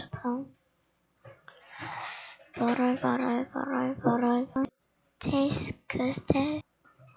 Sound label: bottle